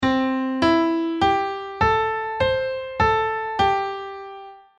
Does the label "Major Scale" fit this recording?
Yes